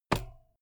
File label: bmx bike pedal grip single